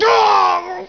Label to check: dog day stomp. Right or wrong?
Wrong